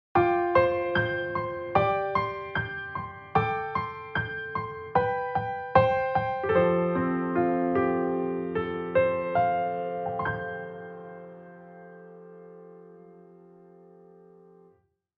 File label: Addictive keys